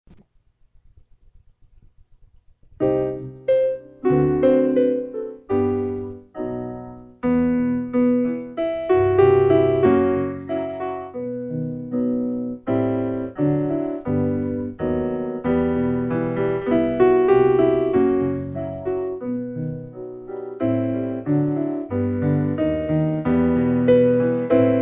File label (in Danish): sensommervise - melodi + akkompagnement